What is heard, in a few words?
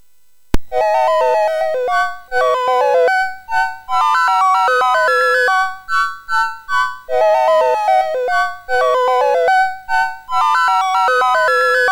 sid, MOS6581R3; Utter Ego Demo